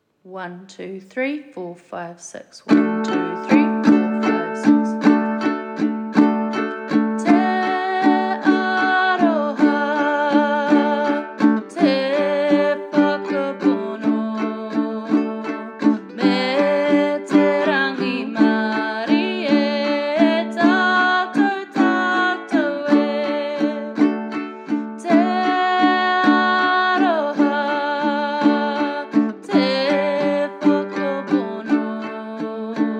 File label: Uke; Uke book Te Aroha